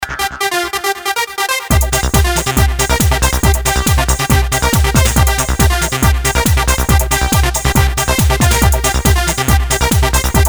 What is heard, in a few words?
indoor, progressive trance